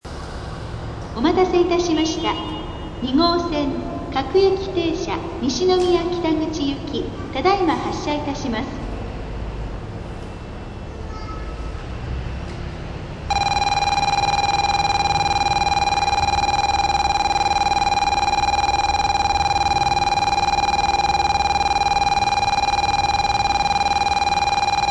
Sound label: takarazuka bell, station; 2号線（今津線）発車ベル; 阪急梅田駅; hankyu